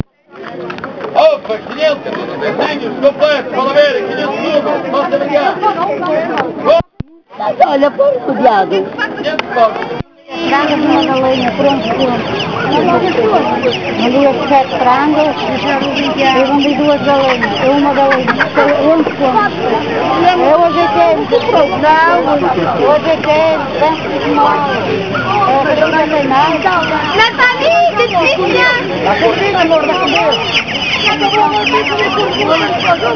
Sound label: market